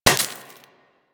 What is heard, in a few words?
Laser Impact Metal; Surface